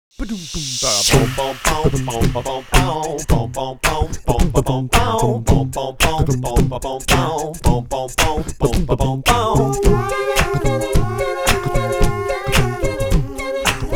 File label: Funky Vox Man 110